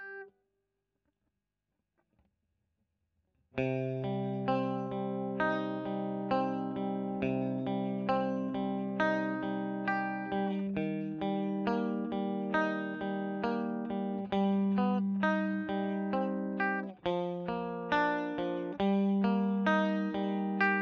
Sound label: E.GTR Royer